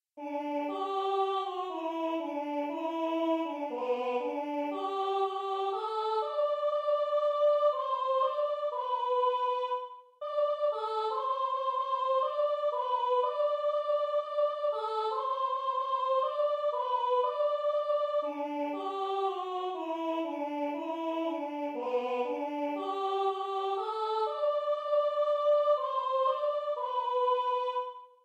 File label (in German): 1 Stimme, Jagdlieder; Der Jaeger aus dem gruenen Wald